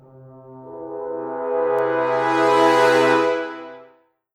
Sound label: Suspense, first generation iPod sounds; i OS